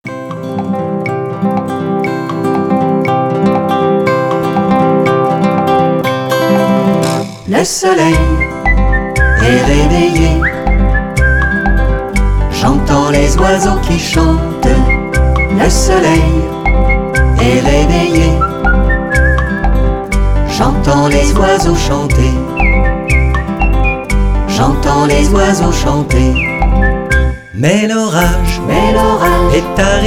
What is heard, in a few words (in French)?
Chanson